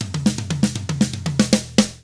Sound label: 116 ROCK, Double Platinum Drums; ILIO